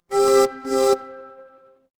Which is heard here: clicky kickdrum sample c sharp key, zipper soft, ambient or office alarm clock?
office alarm clock